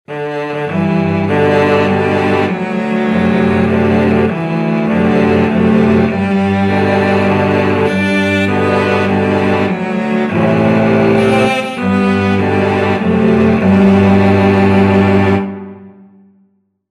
cello quartet

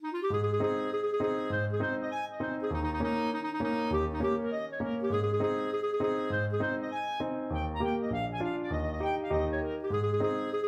trumpet hornpipe, clarinet

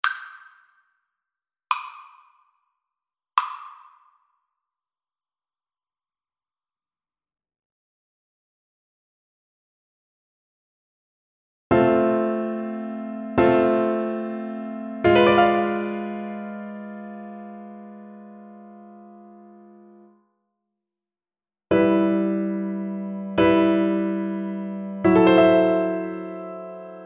sonata, Cello; marcello

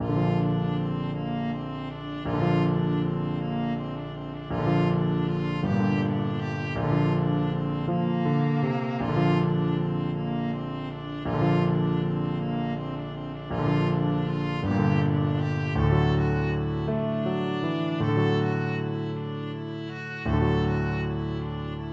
viola

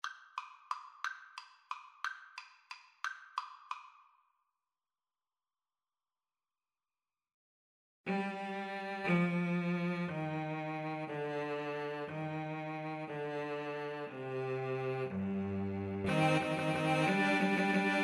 carol of the bells, Violin Cello Duet

